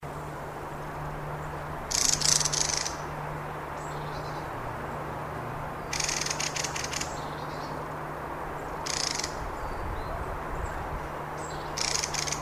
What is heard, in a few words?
mistle thrush christchurch park ipswich